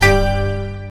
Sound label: fantasy golems hit sound, crunch